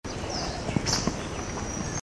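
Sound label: berniers vanga call, Madagascar; 1 female; Madagascar - 20th Oktober to 16th November 2003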